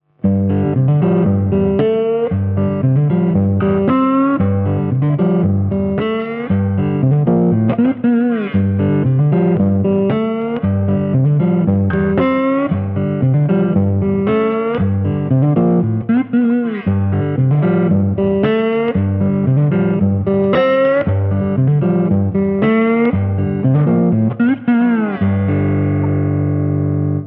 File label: slide